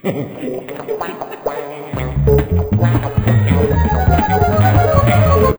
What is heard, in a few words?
Ringtone; Smeshariki Museum of Communications